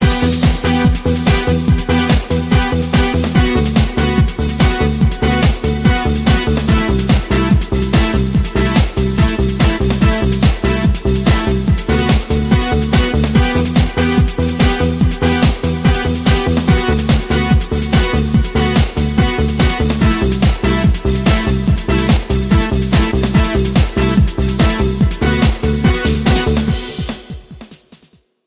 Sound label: ringtone russian techno